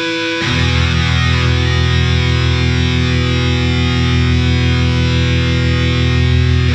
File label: SPACE GTR; Funk Guitar; Zero G - Funk Guitar